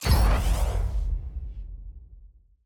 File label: lobby button quit click; parties